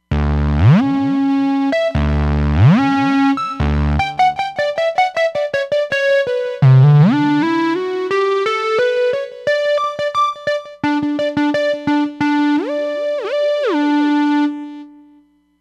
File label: Saw Lead